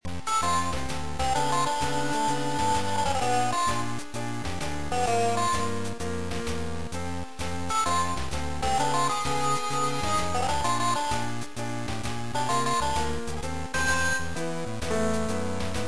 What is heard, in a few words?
game over